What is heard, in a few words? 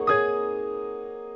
CHORD L, Drum & Bass 140 BPM; Ueberschall; Drum & Bass Flash Trackz